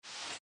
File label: crate